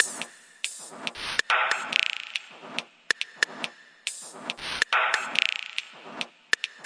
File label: 140 bpm thoughtful space clicks